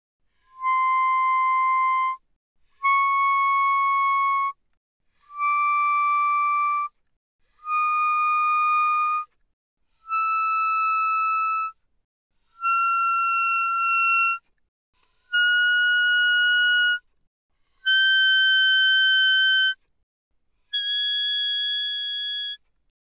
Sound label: Eb Clar pp C6 Ab6, Eb clarinet, woodwinds